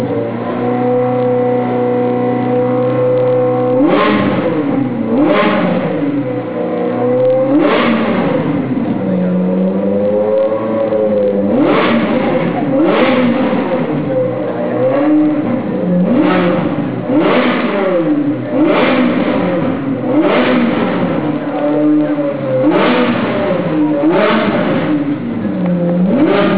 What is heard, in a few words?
Ferrari Modena Challenge